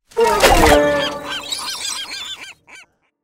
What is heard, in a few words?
gulls appear; Lucky's Fish and Chips